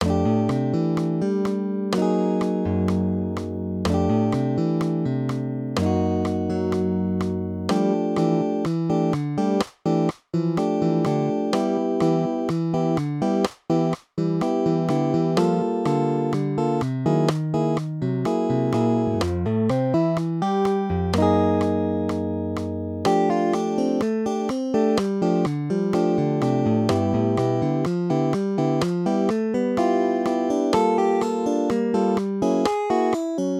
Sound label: duet, guitar; 4/4